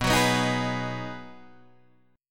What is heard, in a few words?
B 6th B down Guitar Open D